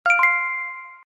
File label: g pay; Звуки оплаты банковской картой, контактная и бесконтактная оплата, через Apple Pay и Googl